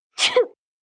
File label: Sneeze Choo, Sneeze, 1 child; Sneezing Sound Effects